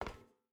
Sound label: footstep hide metal walk; Rust